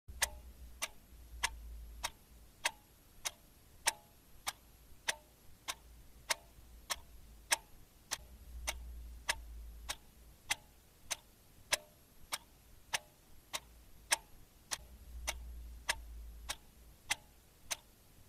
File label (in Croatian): kucanje sata